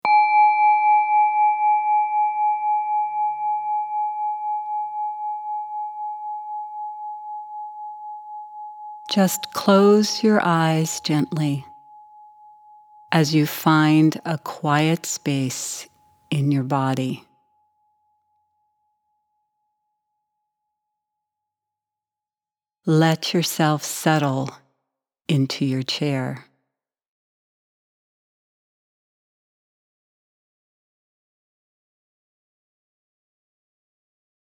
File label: meditation